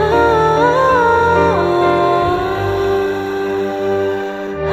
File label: voice and strings, Sad